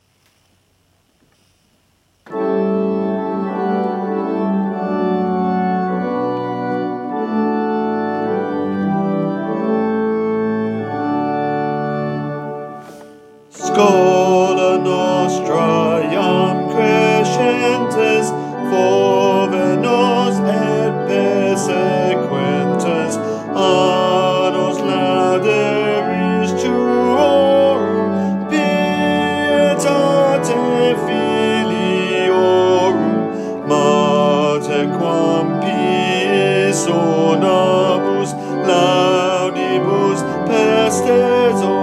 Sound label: School Song backing track